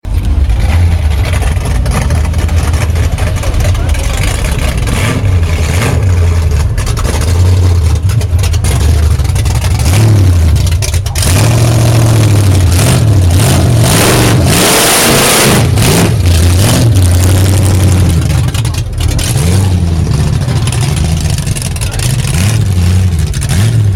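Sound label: motorcycle sound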